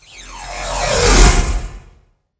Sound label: rift transition